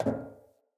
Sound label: Walk Metal, Footsteps; Characters; Enemy; Mockeries; Mockery